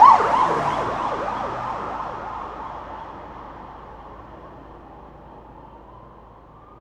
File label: Siren; Sonic Foundry (Sony Creative Software); Underground UK House & Electro